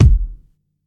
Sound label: big kick f key, Loudest frequency: 182Hz; kick drum samples